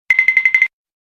am thanh chay de thuong

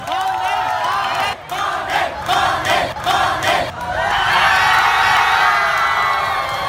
Tieng o co vu hon di hon di

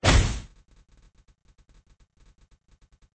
Am thanh chuong

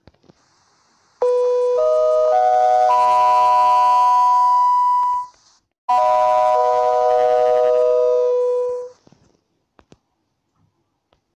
tiếng chuông thông báo thông tin

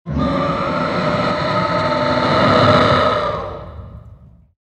Tieng gam cua quai thu

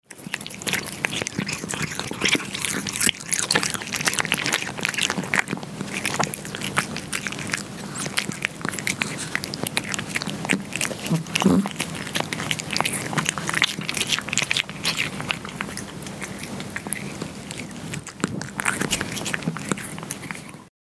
Tieng meo an thuc an

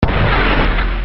Tieng sung no khi trung vao muc tieu

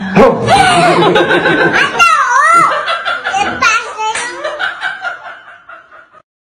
tieng meme a du be gai giat minh ha

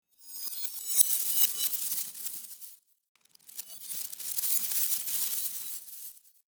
Âm thanh phép thuật mất tích biến mất

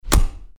Am thanh dong cua tu lanh

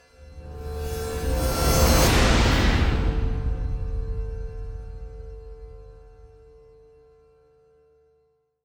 Am thanh bau khong khi gay soc cang thang

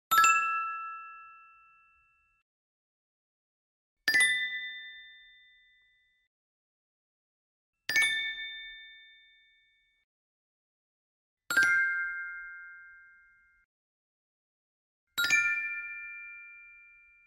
tiếng chuông subscribe

